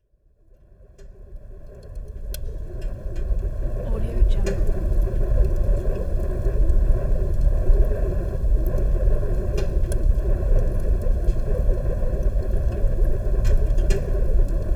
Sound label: furnace draft whistling